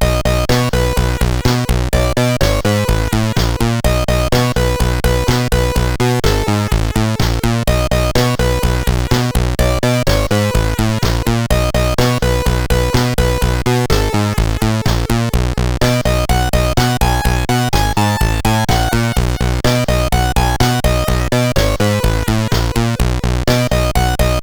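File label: Lights On, MOS CSG8580R5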